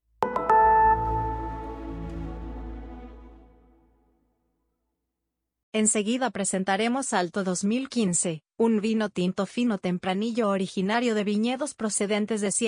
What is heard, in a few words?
Alto